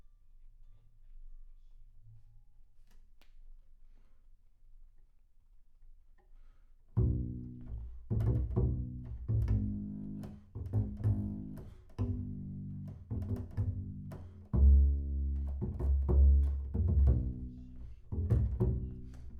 Bass Room

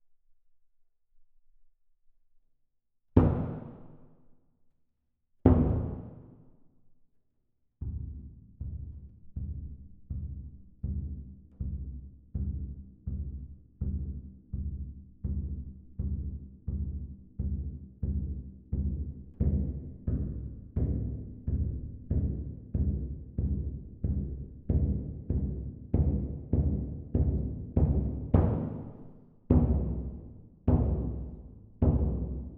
Perc

